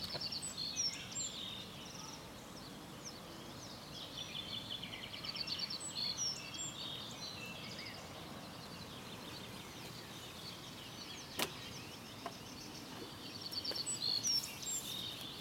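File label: Emberiza pusilla, pikkusirkku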